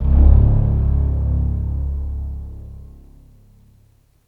arp

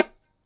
hi hit, tabla; Dirt-Samples

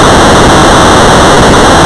att; cosmicg

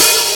DX Open Hat

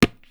tabla; Dirt-Samples

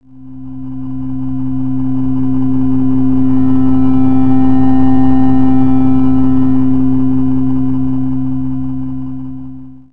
bassline

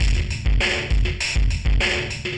ind dist

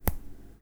hand mono

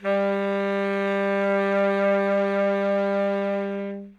notes g, sax; Dirt-Samples

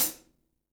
gretsch closed hat; Dirt-Samples

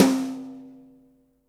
gretsch brush snare; Dirt-Samples

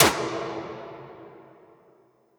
snare reverb

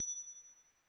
tink

